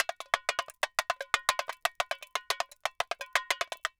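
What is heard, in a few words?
tamborim, DRUMS SAMBA; Best Service ProSamples vol.14; AKAI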